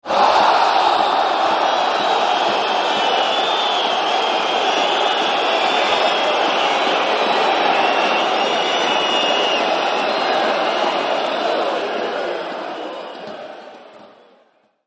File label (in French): Sifflets